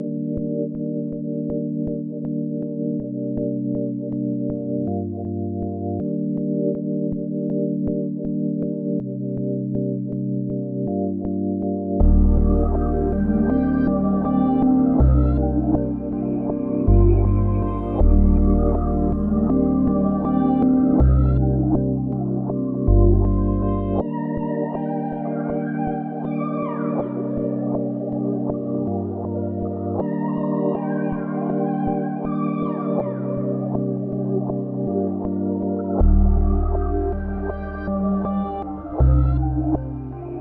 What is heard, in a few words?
160 BPM G Min; Melody; RnB; Aroma; Cymatics; Cymatics - 2022 Melody Collection - RnB Pt 1